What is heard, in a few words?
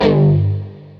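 metal Palm mute Power chord G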